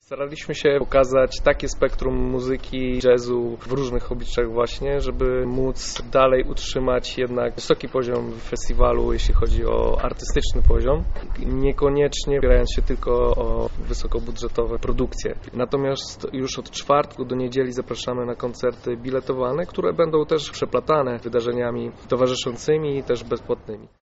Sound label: jazz; lublin